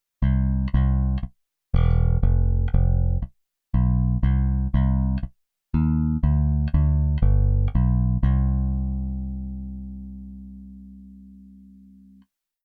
Bass Reggae Bass

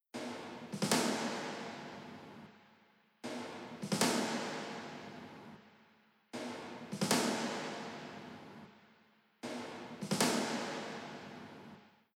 FutureBass Rhythm Loop Non Cut

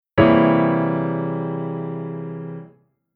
Chord Poly; Jissen

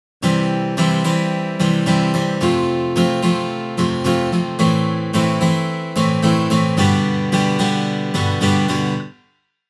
guitar 8beat midi

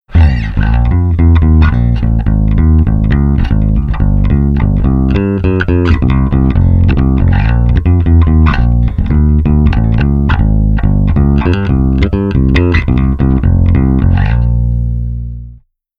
bass amp sim DI on

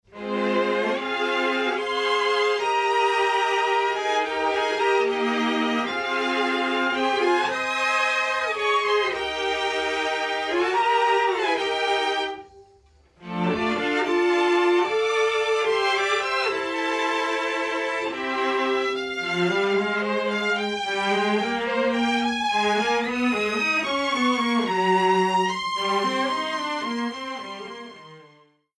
Strings Amb Comp On

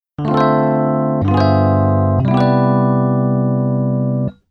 Keyboard EP Soundmake Preset

